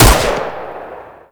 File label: Rifle Shot; Weapons; Warframe; Corpus; Ruban